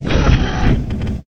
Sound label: Gate Close